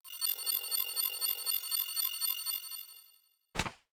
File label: call